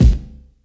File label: SP1200 KIC, Kix Snares; Hip Hop; E-MU Formula 4000 Series Vol. 1; Hip Hop Nation